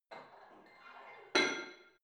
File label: barbell plate put on hit damped reverby soft; sport freizeit; fitnessstudio